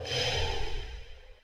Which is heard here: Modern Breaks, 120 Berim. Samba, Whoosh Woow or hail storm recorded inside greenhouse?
Whoosh Woow